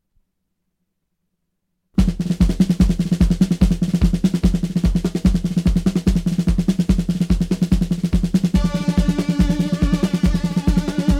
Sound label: title music; cricketer